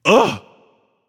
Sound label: ugh; danzaburou